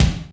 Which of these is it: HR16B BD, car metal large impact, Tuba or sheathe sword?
HR16B BD